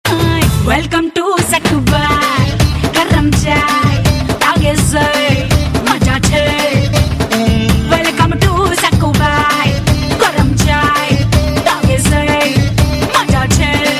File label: Damarukam